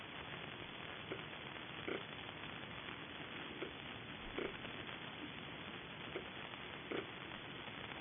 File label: L griseus larvae field knocks